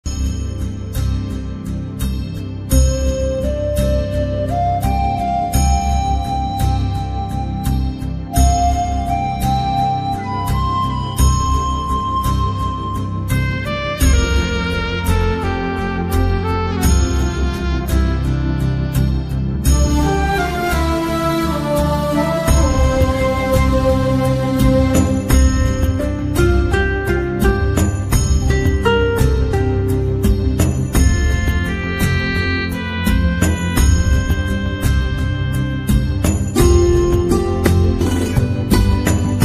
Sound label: desh bhakti ringtone vande mataram ringtone instrumental; independence day ringtone